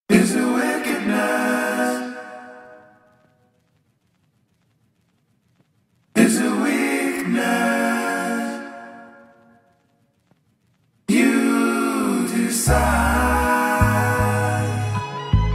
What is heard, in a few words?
instrumental